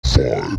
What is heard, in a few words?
VOX FX; neuro; Stanza